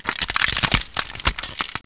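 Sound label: ice death; QZDoom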